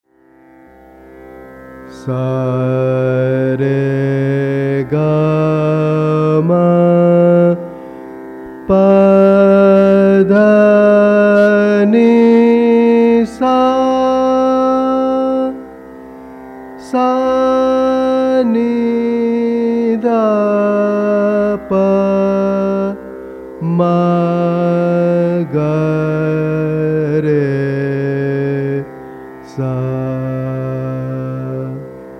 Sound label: raga Bhairavi